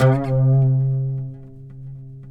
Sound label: PLK OUD; Roland - LA Composer vol. 3